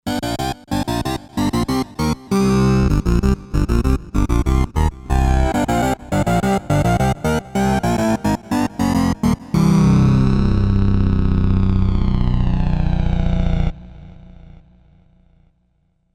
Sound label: Guitar Synch; Cobalt8